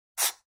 Pencil Straight

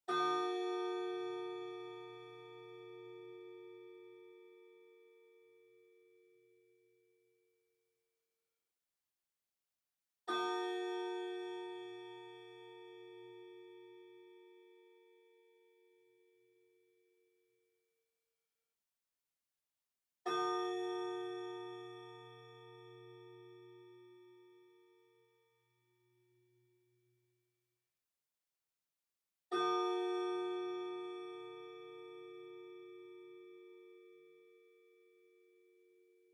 Pendulum Clock Chime Far High Set

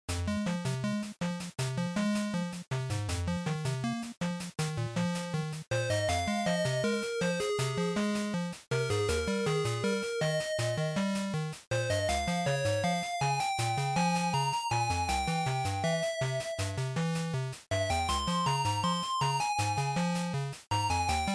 Action ED

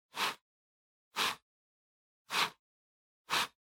PC Mouse Move Hard Pad Short

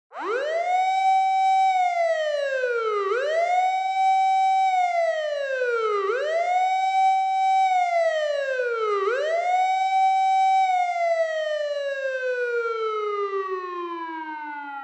Police Car Siren Close

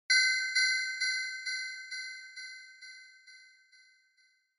Onoma Inspiration High Delay

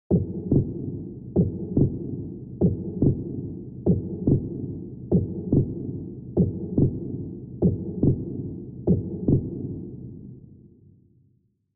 Heartbeat Slow Reverb

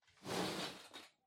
Loose Fill Packaging Far Hand Out; 梱包材

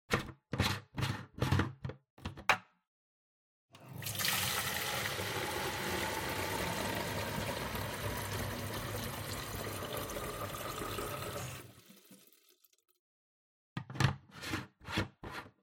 Air Purifier (Tank Water)

